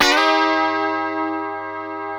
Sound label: D 4 D funk chord, guitars; Spectrasonics; Hans Zimmer Guitars VOL-1